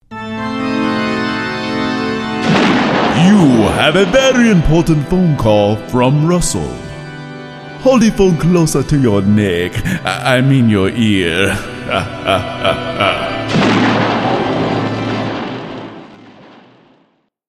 Dracula Tones

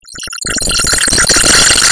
Horror Eerie Tension Swell

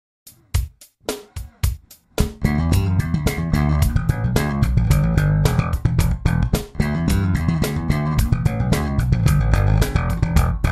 Slap fill in Em

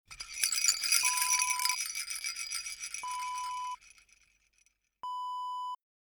baby rattle

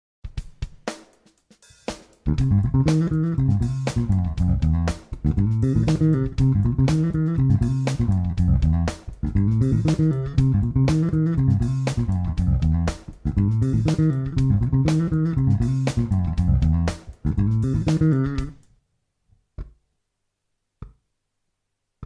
Fill in C7

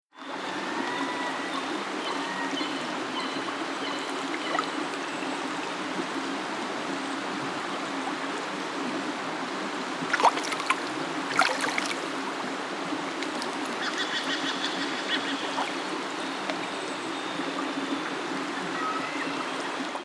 Salmon River Mouth

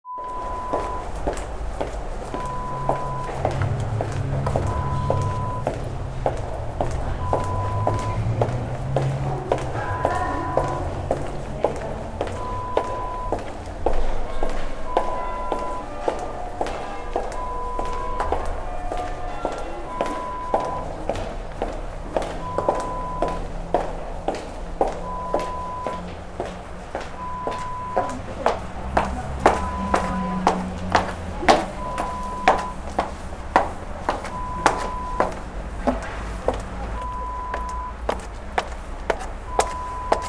Footsteps Subway

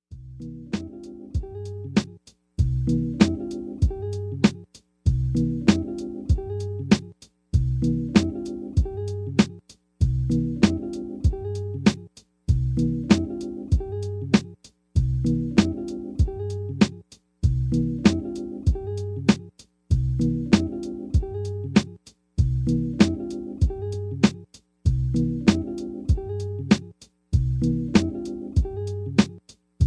MPC Beat

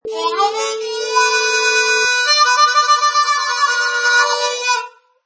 Harmonica Riff

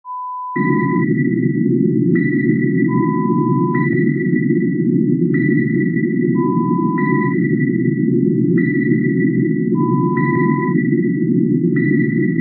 Submarine radar sound

